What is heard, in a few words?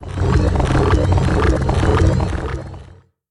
chamber, buildings; Factorio Paranoidal mod; erm zerg hd assets